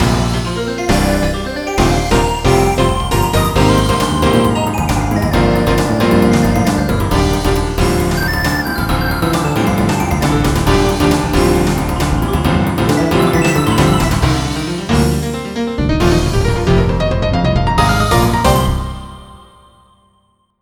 jazz

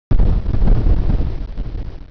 Thunder; Quake; PainKeep

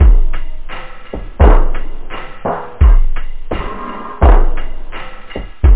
Funk; da capo vol1

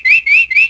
CANARY, animal; animal fun

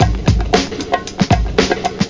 breakbeat; beats

